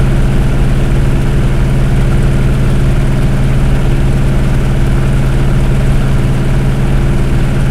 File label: hellcat cockpit, engines; War Thunder